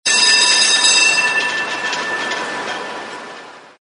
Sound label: bell trolley car ring